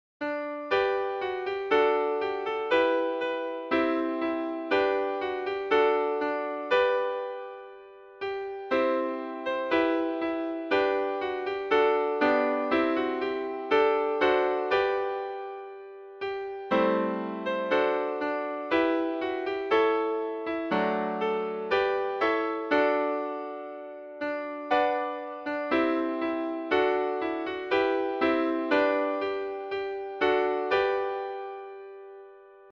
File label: melody midi